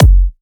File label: Kick, Psytrance; One Shots